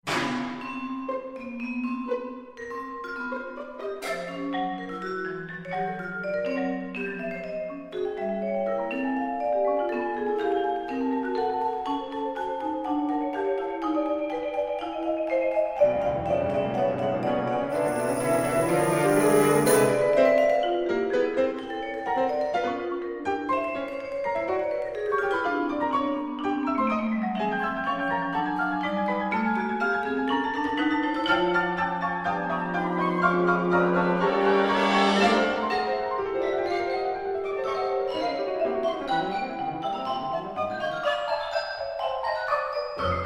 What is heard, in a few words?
PERCUSSION CONCERTO